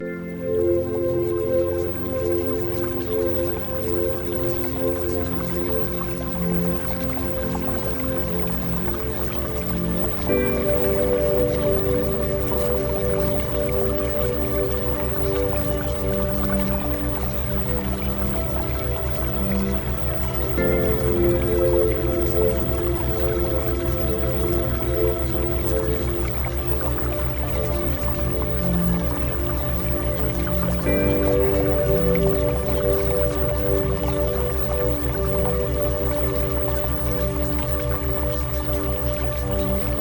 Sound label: Music Theta Waves; SPIRITUAL ASCENSION; spiritual mastery